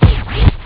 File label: scratch